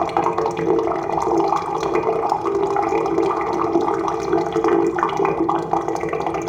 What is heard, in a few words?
Galleon Pipe Gurgle Loop; Ambience; Warframe; Grineer Galleon